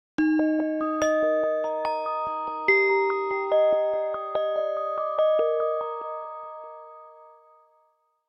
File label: Wind Chime, ringtones; Samsung SM-W2019